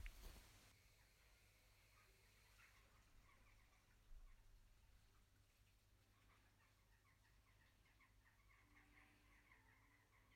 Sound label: noisy birds outside my bedroom window